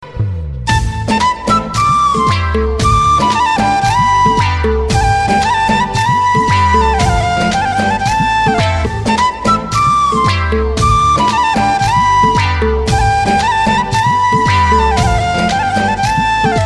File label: flute musical ringtone, classical